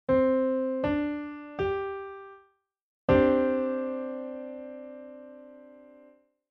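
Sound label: chord piano sus2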